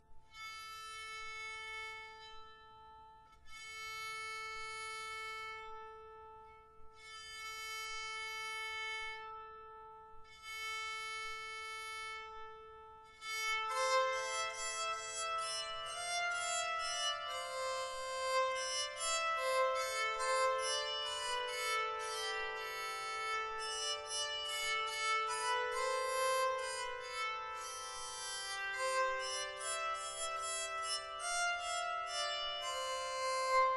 O Come Tenor, bowed psaltery

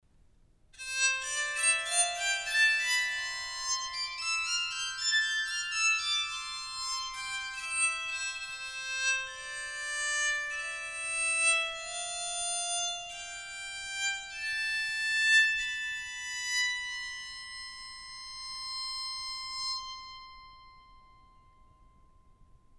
cherry sound tagged, Soprano bowed psaltery

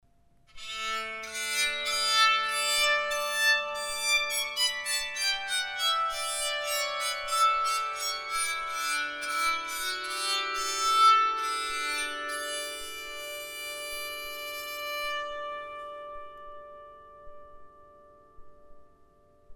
D Tenor, bowed psaltery

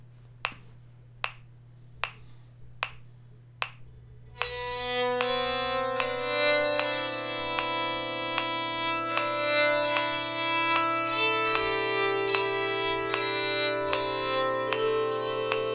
PS, bowed psaltery; Londonderry Aire

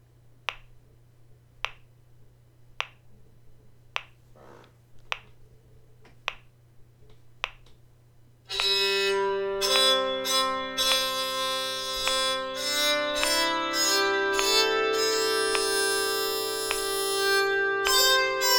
bowed psaltery; Shenandoah

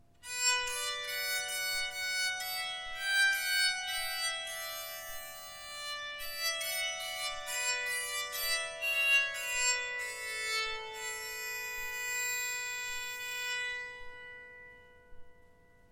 song, bowed psaltery; Ringing Strings Bowed Psalteries

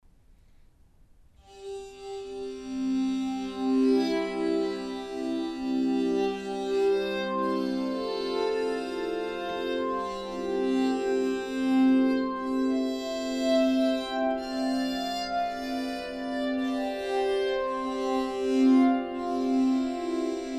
chestnut bari sound, bowed psaltery, Baritone

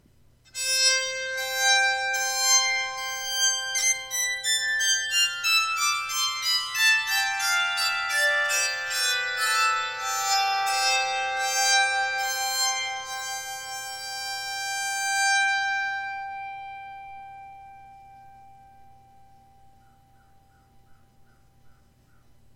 scale, bowed psaltery

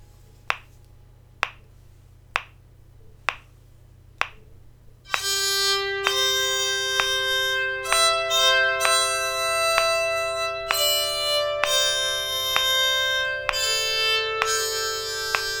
Amazing Grace, bowed psaltery

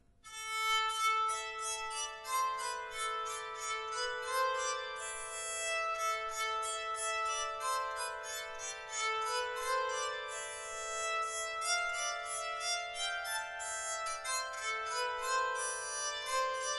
Tenor, bowed psaltery

